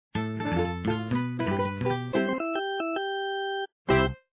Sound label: Day Start; papas hot doggeria; android; x68000